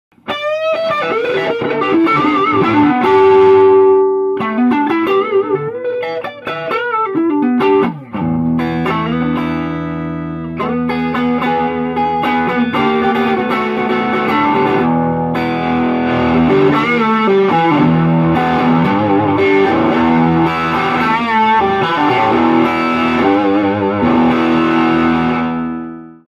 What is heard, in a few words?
Amp DRIVE